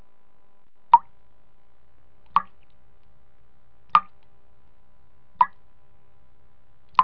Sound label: drop; goccie che cadono